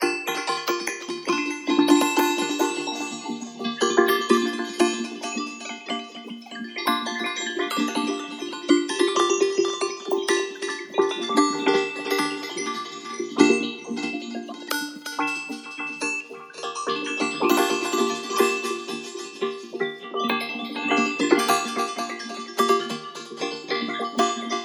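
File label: pad ambience quantise Eb major, synths pads; base pack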